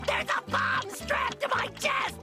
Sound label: bomb